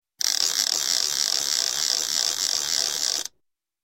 Toy turning Toy; Sound Ideas 2000 Series

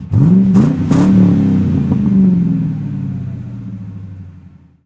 99 Mustang Rev, Automobiles; SFX Kit

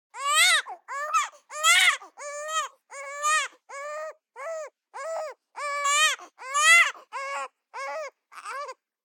BABIES SCREAM Boy 3 months Unconstanst Throat; Baby Boom

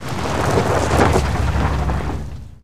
Auto Tires Stop Gravel; Automobiles

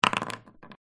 rolling dice; Dice World

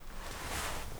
FABRIC Movement Short; mono; Universal Sound FX; CLOTHING

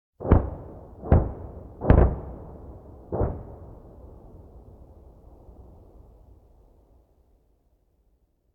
Artillery Cannon Artillery; Sound Ideas; Guns

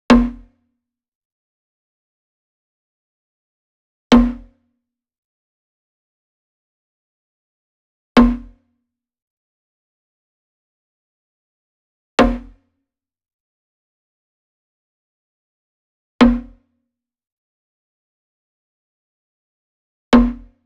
Wood Percussion Wood Drum Floor Hit Beater Near; boom library; Cinematic Strikes Construction Kit

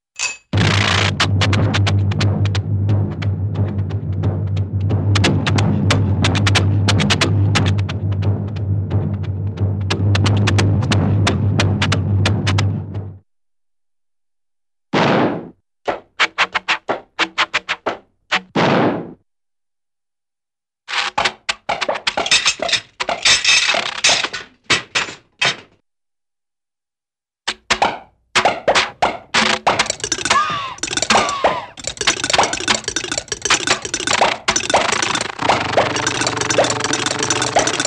Funny Motor Sounds, Cartoon; Hollywood Edge; Cartoon Trax

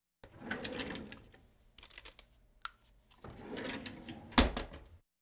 Hospital effects

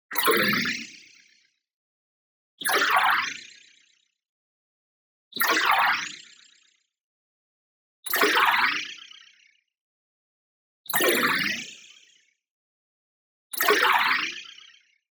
tonal, squelch arrival; boom library; Anime Essentials

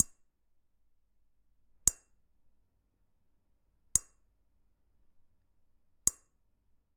Generic Click Glass Tiny

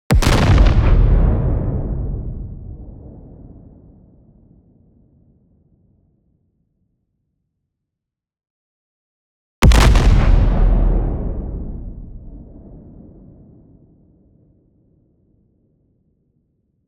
EXPLOSION LONG Soft Tail Pitch Descending; boom library; Anime Essentials

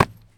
Baseball Hit Wall

